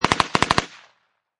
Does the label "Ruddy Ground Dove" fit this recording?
No